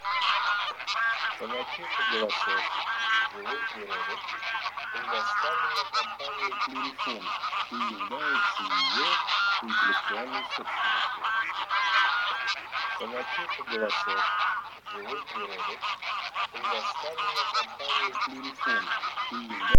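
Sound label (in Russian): Гусь серый базар Oche Selvatiche, манок; plurifon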